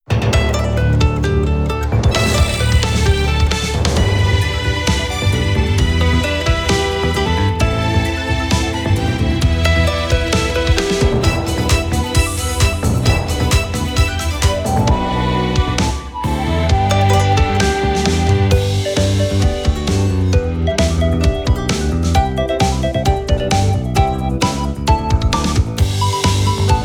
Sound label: Jingle Title; Taiko no Tatsujin Tokumori; Wii U